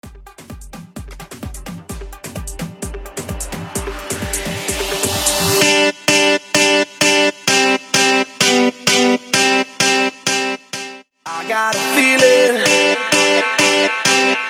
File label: Electro Dutch Afro 129 BPM